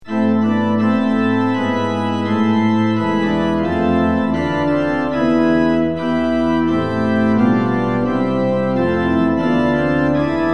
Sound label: tune; hymns; lillenas